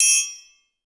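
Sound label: TRI STC LO, triangle staccato low; Best Service; Peter Siedlaczek's Advanced Orchestra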